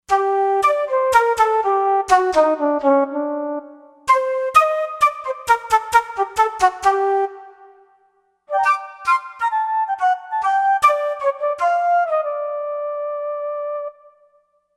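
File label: Attack Flute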